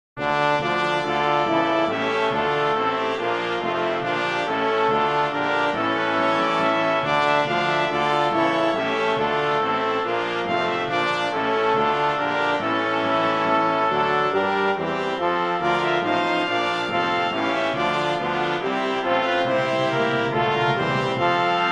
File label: Hymn TRAD BRASS